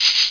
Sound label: cleaner move; jorgan